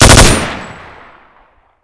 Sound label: m4a1 fire burst